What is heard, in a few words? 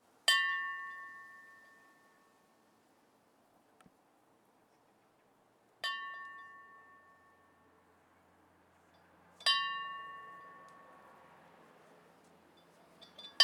Hand bell; spoteffects